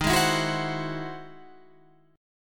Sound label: E Augmented Major 9th